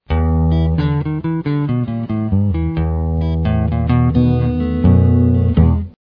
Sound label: blues guitar